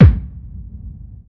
fat steel kick drum b key, Royality free bass drum single shot tuned to the B note